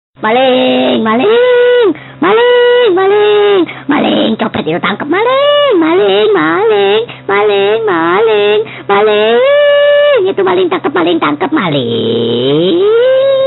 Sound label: message